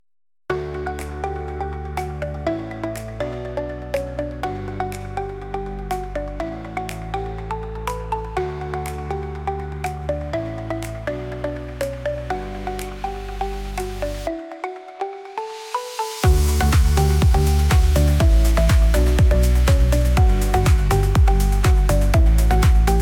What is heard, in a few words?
Techno-Tango